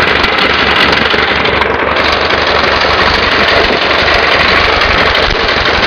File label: old cadillac engine